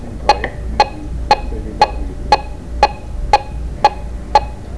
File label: Beep